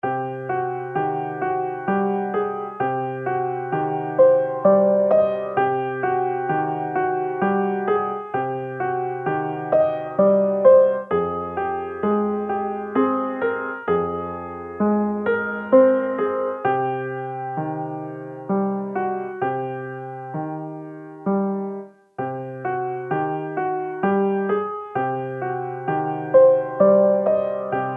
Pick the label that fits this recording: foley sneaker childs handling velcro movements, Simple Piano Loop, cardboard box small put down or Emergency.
Simple Piano Loop